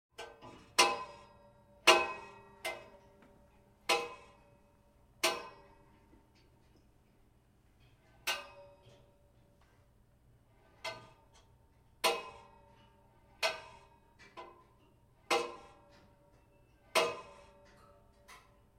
Heating Pipes Clanging in House; Household

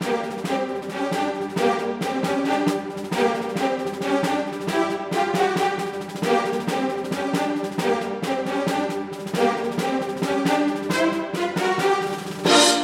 Breaking News

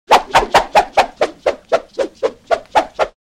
Swish Multiple Swish Fast; Crashes Impacts

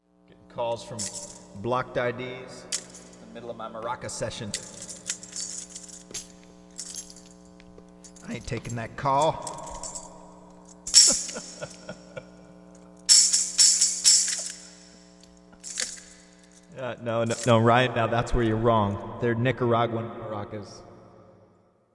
Maracas Interlude; Nicaraguan Maracas

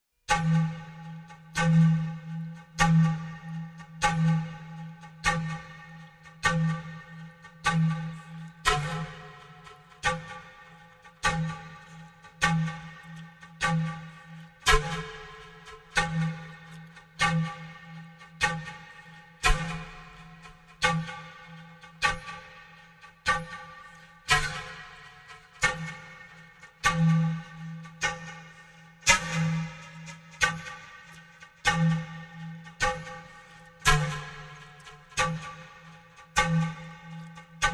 Pan Pipes Andes; wind instruments from around the World; Andy Findon; Ancient Treasures, exploring wind instruments from around the World

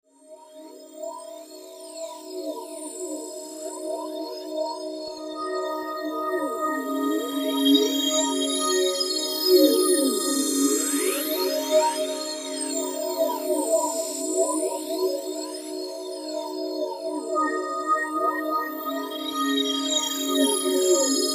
Backdrop Wash, Production Music Beds

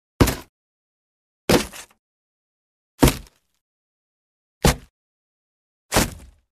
Bullet Hits Wood Soft Sandy Bullet Thud Into Wood; Hollywood Edge Foley Sound Library

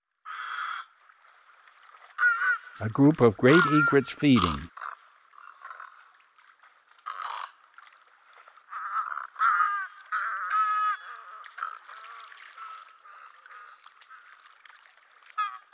Great Egret; Eastern North America; Dan Gibson; Wildlife Identification By Sound

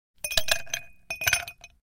Ice Clinks in Glass Without Liquid

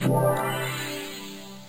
file incoming

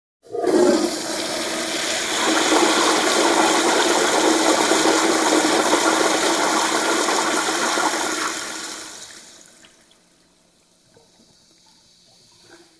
Toilet Flush Public Toilet, Household

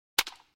Wooden Bat Hits Baseball w Natural Decay; Sports

